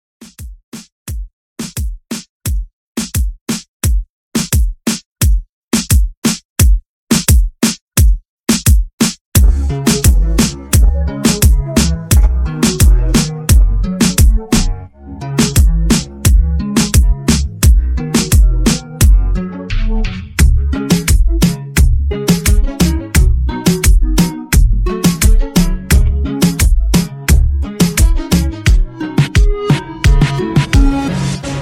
Reggaeton Club 87

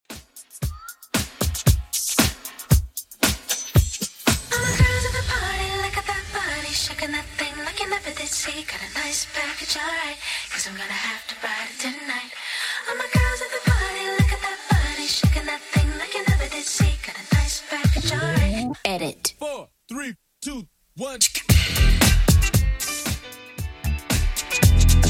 2ks Redrum 115bpm

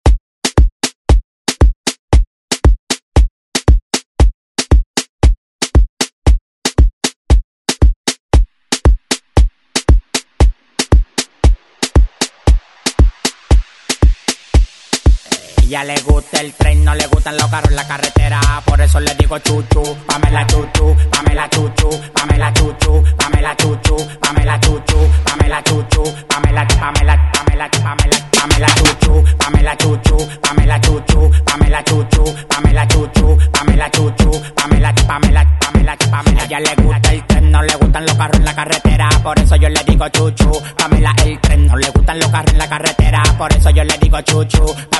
ReDrum; DJ Mhark

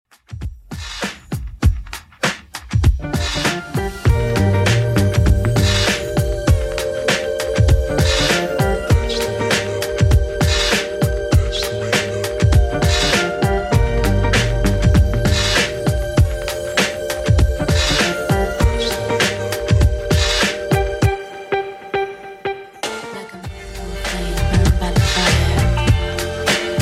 90s Redrum 99bpm CLEAN

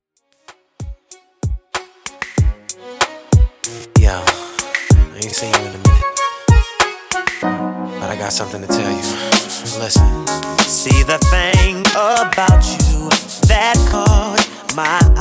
Re Drum 95Bpm

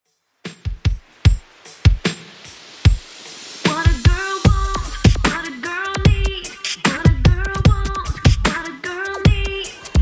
90s Redrum 75bpm

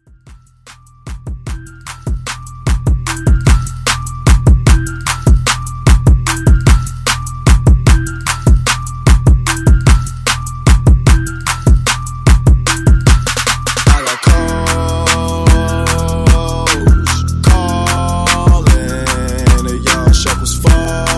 Top40 Extended Redrum 75 Bpm

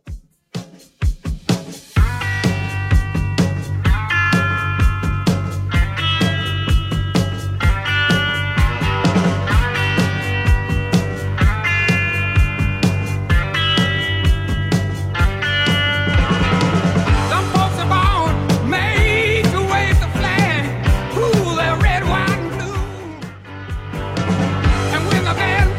60s Rock Redrum 127bpm

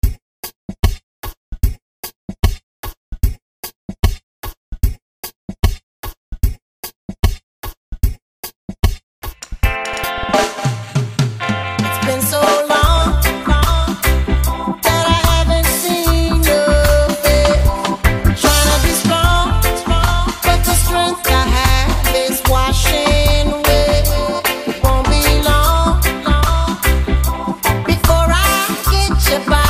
Reggae Remix

